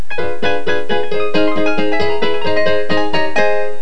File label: big victory